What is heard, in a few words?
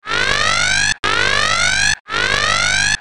Alert Sound Effect